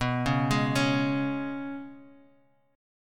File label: G sharp Minor Double Flat 5th B